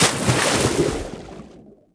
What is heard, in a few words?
Splash